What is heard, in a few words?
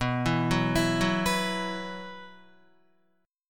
B Suspended 4th, Guitar; Bsus4 arpeggiated; JGuitar